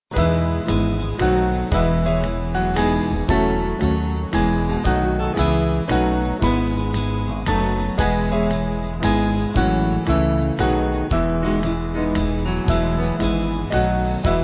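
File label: Band, Music Backing Files for Churches; Hyfrydol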